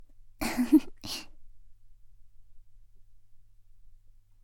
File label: laugh, ASMR; Garden; WithRin-Nazo01